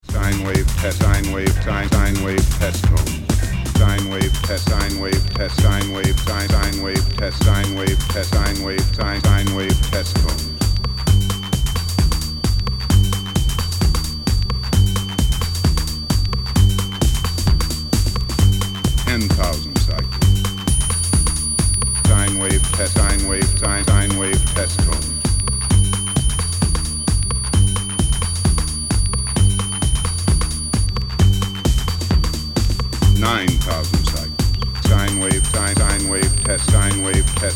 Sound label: Sinewave Test Tone